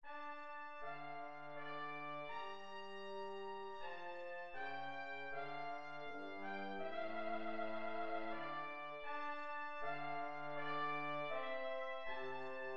sarabande mineur

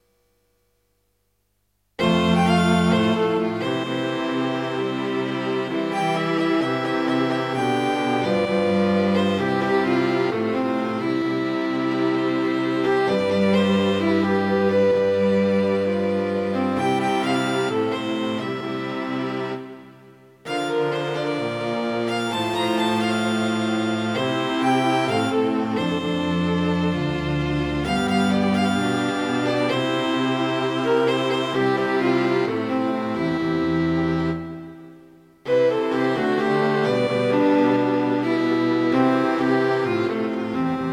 quatuor

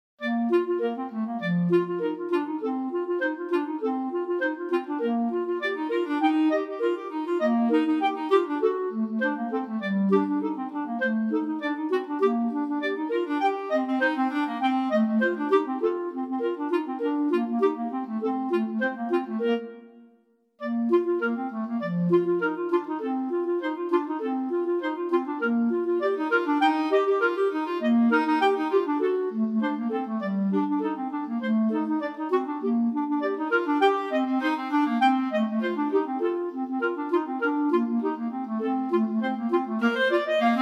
duo pour clarinettes; vignon denys

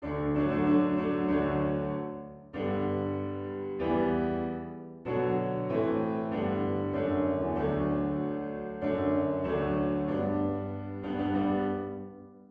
hymne national belgique

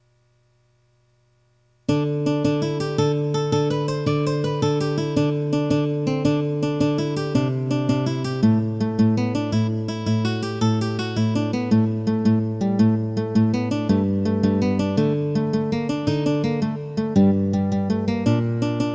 gigue guitare

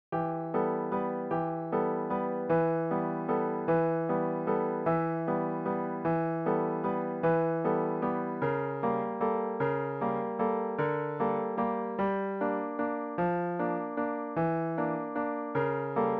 version pour piano seul; l'ange dernier

